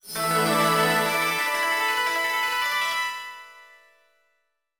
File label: Fanfare; Touch de Tanoshimu Hyakunin Isshu DS Shigureden